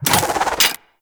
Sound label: Priest Spear Reload; Warframe; Tenno